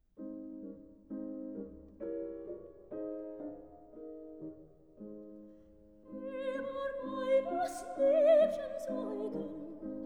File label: song for voice & piano